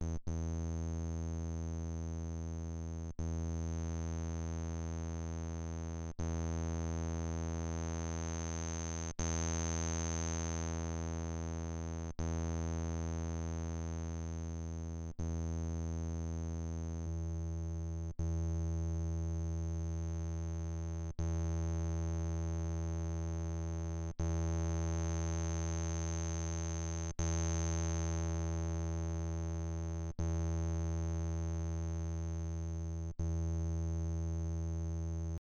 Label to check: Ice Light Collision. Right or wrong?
Wrong